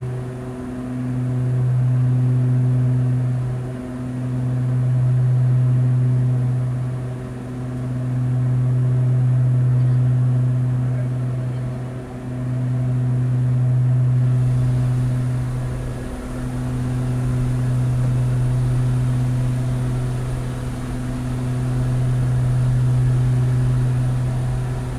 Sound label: Skydiver - ONBRD - INTERIOR PLANE - Flying with Door Open - Chest; MKH8020; Pole Position; The Skydiving and Parachute Library